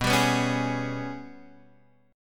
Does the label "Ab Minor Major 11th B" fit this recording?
Yes